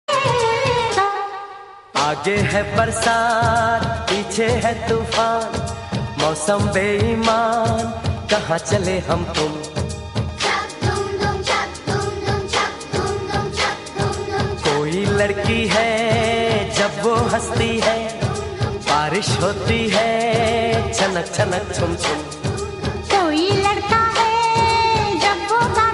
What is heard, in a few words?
hindi song